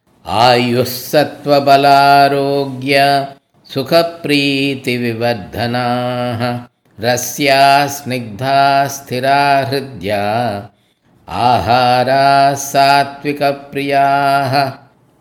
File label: Chanting